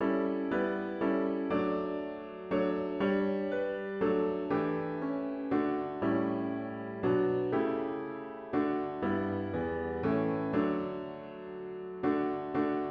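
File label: piano with Intro; worship service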